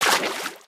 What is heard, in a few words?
water, human step